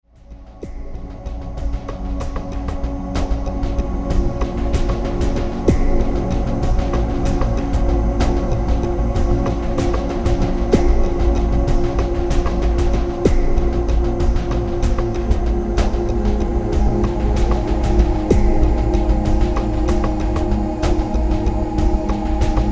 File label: Jungle Steam, Drums Repercussions